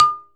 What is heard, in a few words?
Balafon D#5